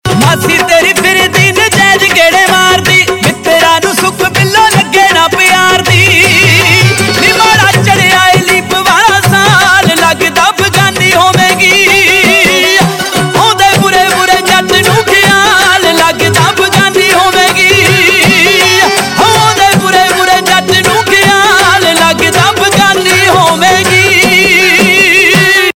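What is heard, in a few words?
Ringtone, Punjabi Pop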